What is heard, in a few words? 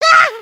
gasp